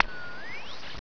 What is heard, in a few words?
nvg on; items